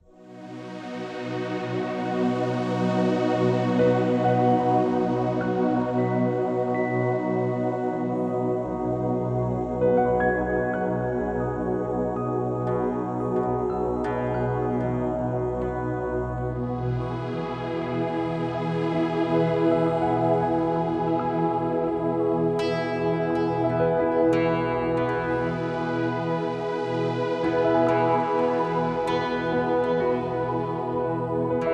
Deep Sleep Entspannungsmusik Sleep Sound Relaxation